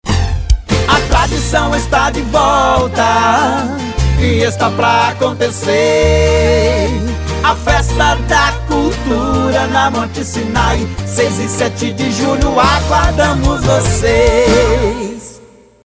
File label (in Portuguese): jingle festa da cultura